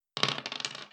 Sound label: dice; ai interactive comic book